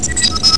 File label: chime; dogwar